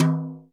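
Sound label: JAZZ tom; Crypton; DrumBase5000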